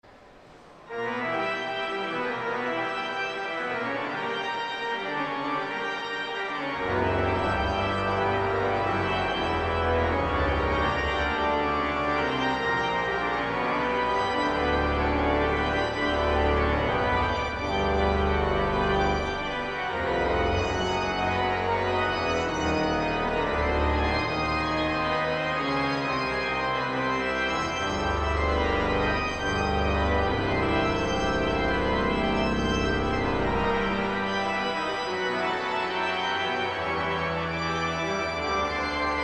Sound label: Organ; Marcel Dupre; Gloria